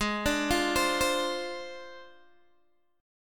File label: G sharp 6th Flat 5th G sharp Guitar Open G